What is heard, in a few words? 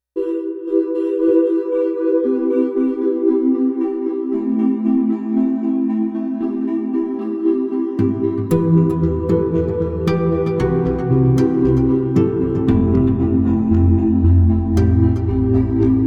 harmonic